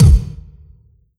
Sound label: Yamaha TG100 Bass Drum